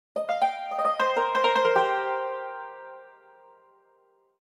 Dialogue Box (Yangqin); B Path of the Teal Lotus

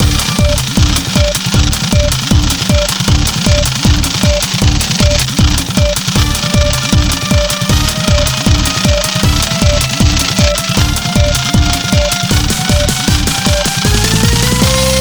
spinner wheel; Buzz! The Sports Quiz; PS2

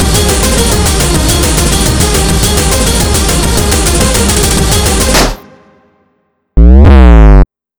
(Instrumental); Friday Night Funkin Little Man 2

